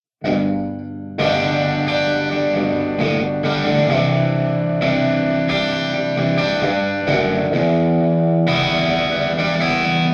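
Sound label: subway play for tips electric guitar; The Sims 3; Windows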